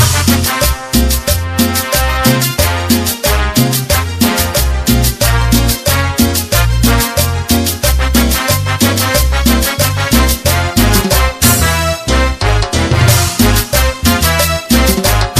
cumbia; Dragon Ball Z

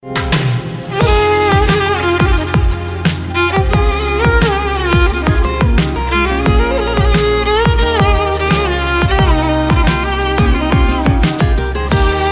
violin

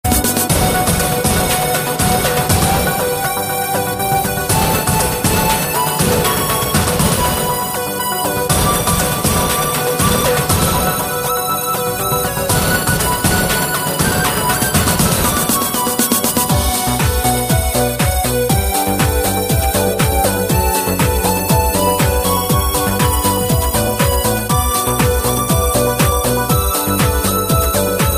digital electro beat

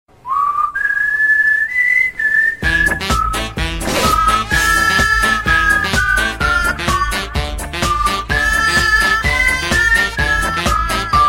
whistle; facebook

